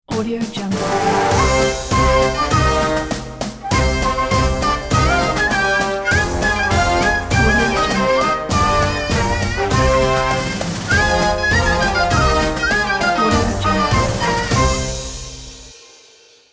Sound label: epic ottoman military march stinger